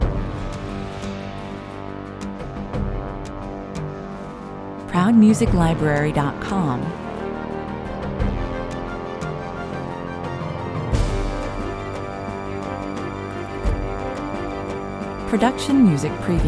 88 bpm, Cinematic style soundtrack featuring a relentless, repetitive driving groove